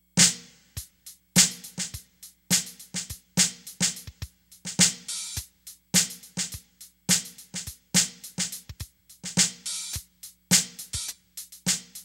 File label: modern jazz, grooves